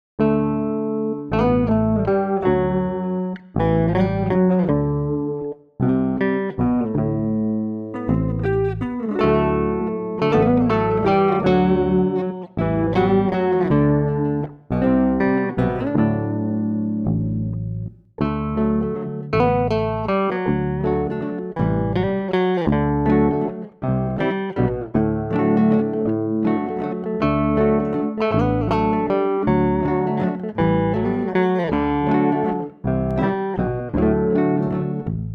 I once had a bass

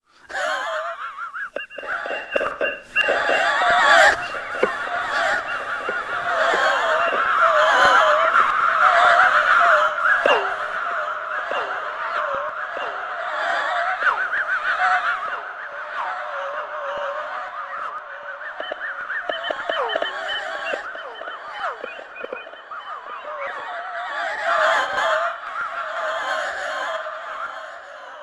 Laugh Your Face Off

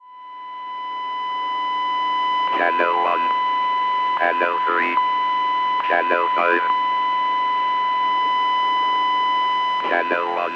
unknown calibration thing

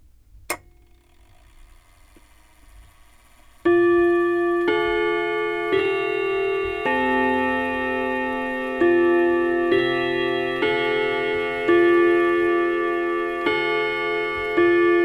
clock test

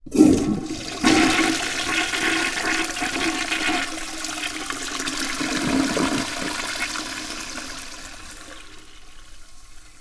Upstairs Toilet With H1E Internal Mics

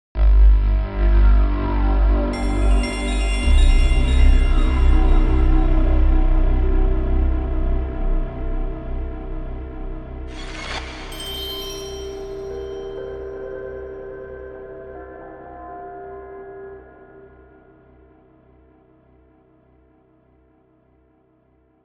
PS1 Boot Sequence

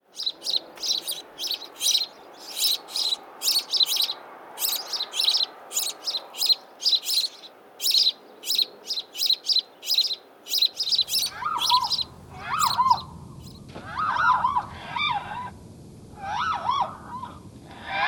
Birds Are Dumb

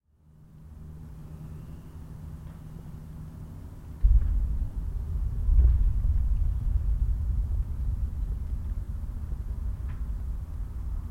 Small Earthquake

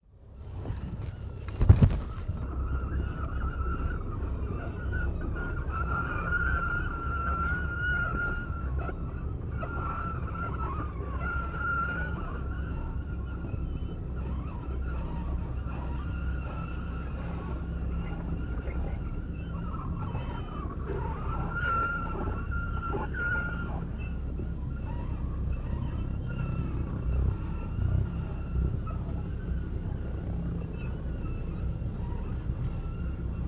Suitcase Loaded On Plane

